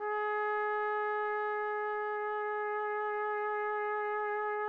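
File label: trumpet; Roland - LA Composer vol. 3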